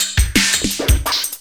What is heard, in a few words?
loop, 170 BPM, drum & bass